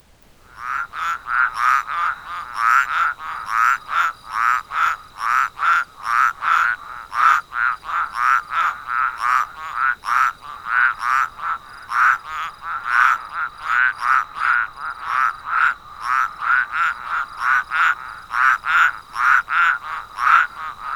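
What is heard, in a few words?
evening toad concert; vechernii zhabii kontsert; zvuki prirodi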